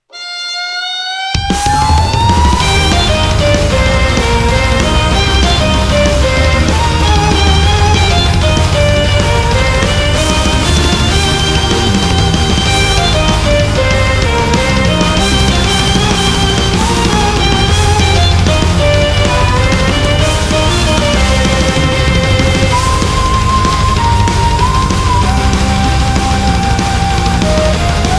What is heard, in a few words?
battle